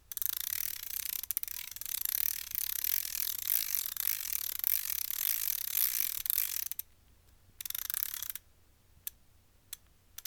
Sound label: Crafstman Ratchet Fast Spin